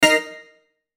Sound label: Hit Stab Sharp Chord High; Blastwave